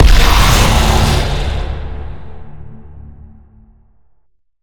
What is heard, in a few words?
devourer death; calamity